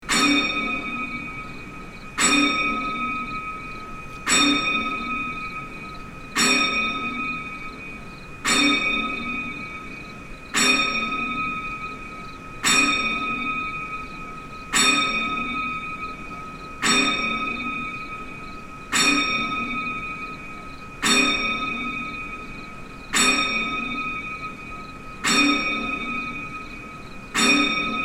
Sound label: hydropower prayerwheel